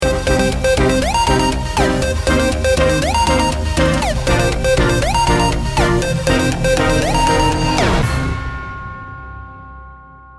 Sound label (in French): jingle; 3 min chrono